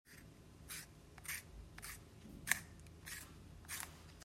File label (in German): Spitzen